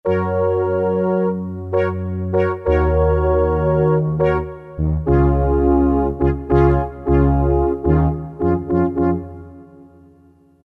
Syn Brass 3, Roland MT-32

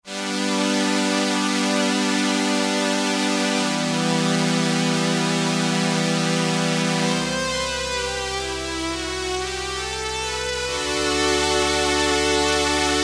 Rox Strings, Roland SR-JV80-08; '60s & '70s

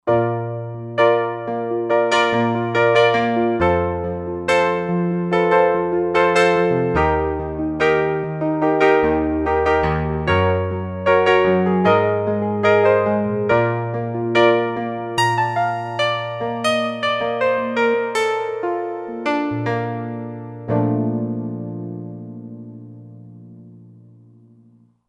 Roland JV-2080 E.Grand

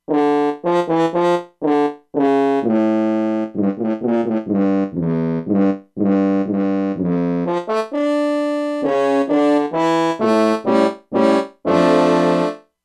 French Horn, E-mu Proteus-2; Factory Presets

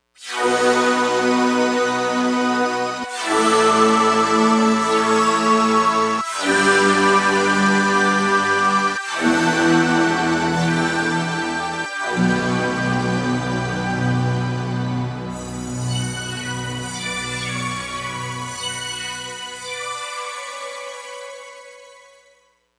Ch Sweep Combi; Digital Synthesizer

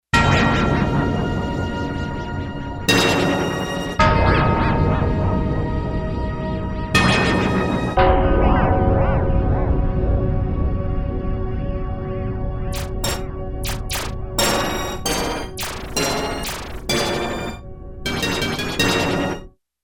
Gated FX

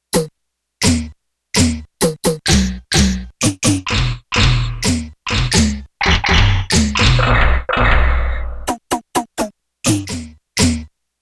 Gated FX

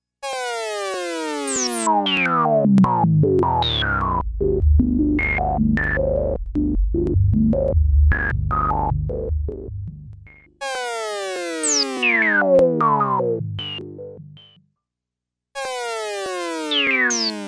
S H Port Rezz

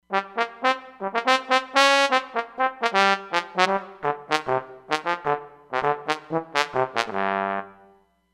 Solo Bone

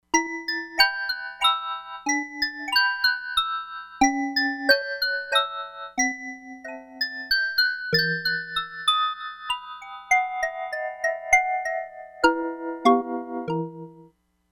MALLET HARMONIC, Crumar Bit 99; Factory Programs

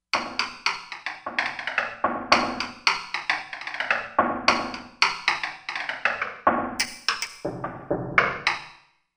Side Bachi, Korg MSC-13S Ethnic

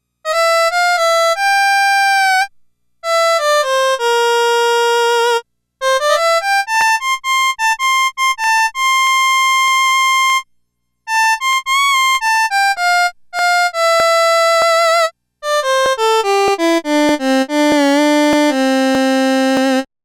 Harmonica

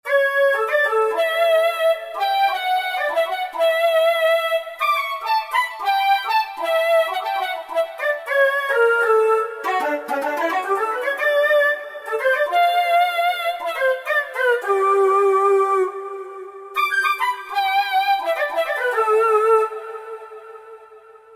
Wavy Flutone, Roland JD-990; Factory Patches

